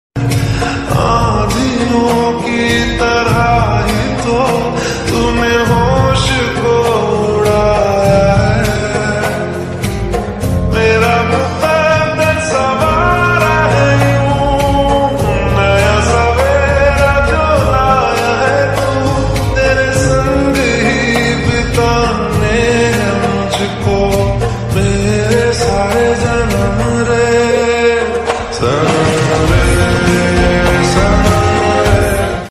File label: lofi remix aesthetic slowed reverb; hindi